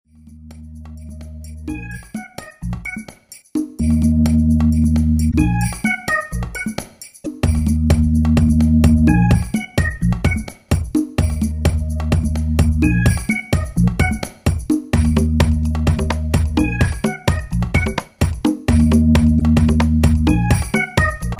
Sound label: Organ; The deep Organ